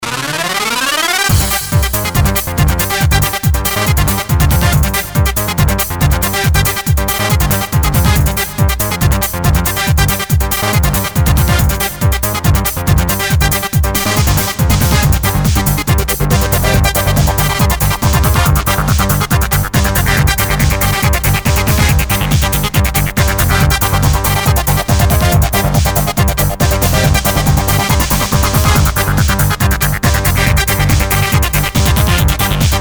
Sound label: indoor, progressive trance